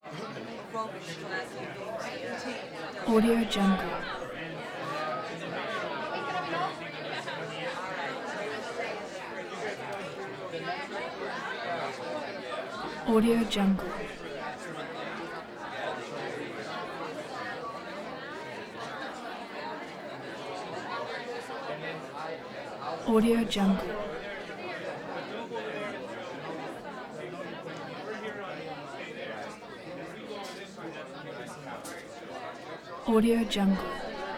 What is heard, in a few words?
party walla